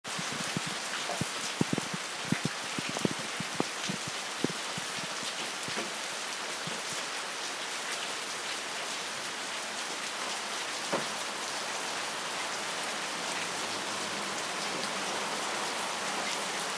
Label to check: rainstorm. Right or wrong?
Right